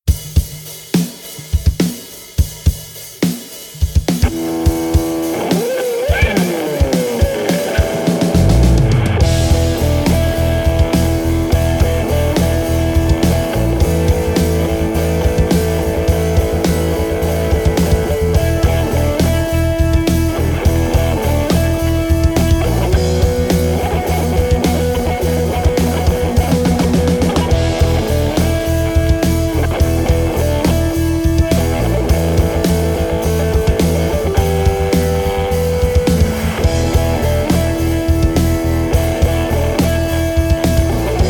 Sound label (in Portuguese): instrumental anos 80